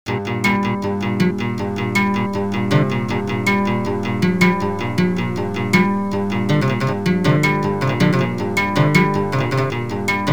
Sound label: groove